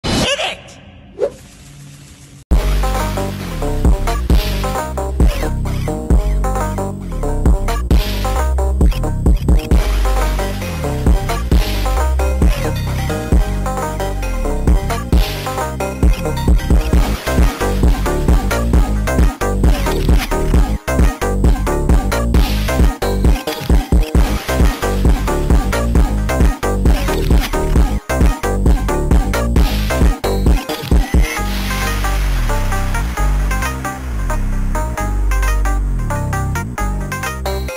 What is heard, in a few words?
baixo krushfunk